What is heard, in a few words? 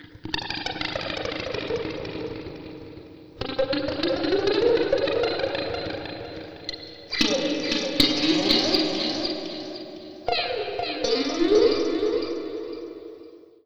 Gtr E, Slo Mo Funk, Lounge; Zero-G Kocktail Kollection; Construction Kitz (Lounge)